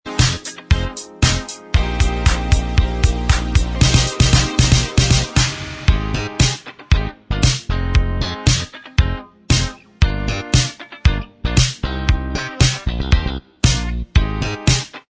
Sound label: Inst Track